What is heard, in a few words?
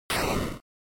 NES General Noise Phrase